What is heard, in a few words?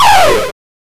8 bit gun shot sound